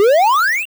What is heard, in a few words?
Charge; glitch in the system